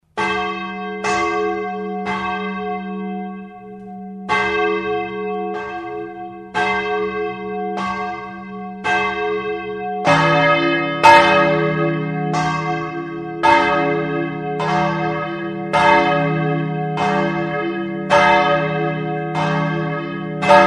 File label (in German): glocke klein mitte gross